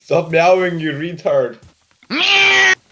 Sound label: skullz meow; torchlight